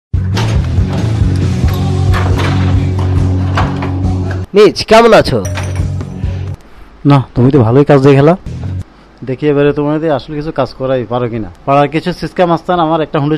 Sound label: bike